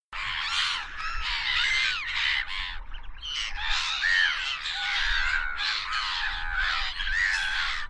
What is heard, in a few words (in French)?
mouette